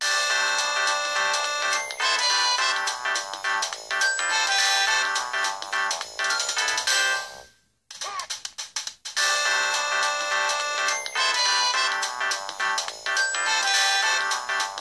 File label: jazzfunk ringtone; samsung sgh-t100